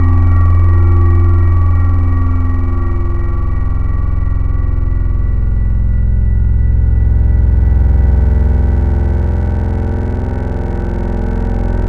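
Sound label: metallic organ bell; Wavetables; Synthetic's Serum Collection; ESW Core Wavetables; Echo Sound Works Core Tables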